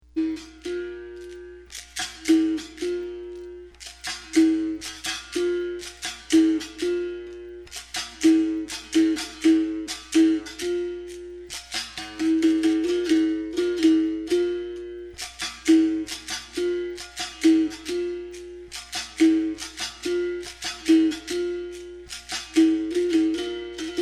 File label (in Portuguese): toque angola, capoeira; toques